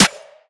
Snare - C#, Hip Hop; Drums - One Shots; Cymatics - 9God Hip Hop Sample Pack

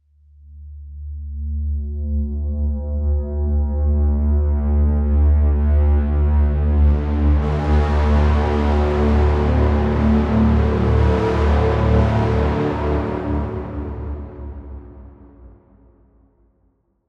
Riser

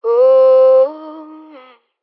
Ad Lib Dry - F Min; Vocals; Cymatics - Eternity Sample Pack; Ad Libs

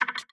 Percussion; Orgin; Cymatics; DOPE DRUMS VOL. 1

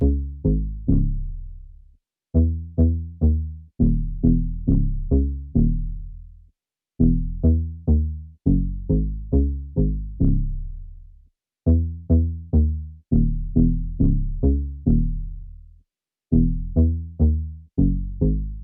West Coast Melody Loop - 103 BPM C# Min Bass; Melodies; Loop Stems; Cymatics; Oracle Sample Pack

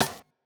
Snare, Dubstep Snares

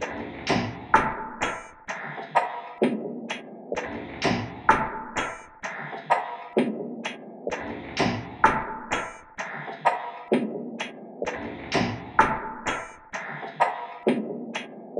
Bad Trip Perc Loop - 128 BPM; Cymatics; Fiji Hihat Loops & Percussion

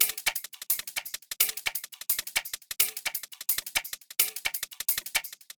Top Loop 172 Foley Shaker; Drums & Percs; Percussion Loops; Ghosthack

